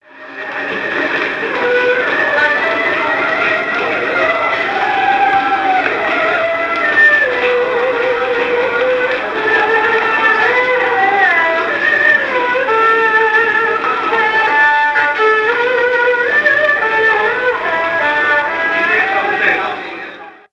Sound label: subway musician; china